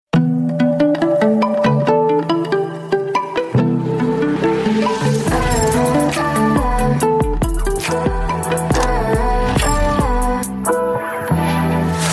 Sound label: Samsung S20 Ultra, Ringtones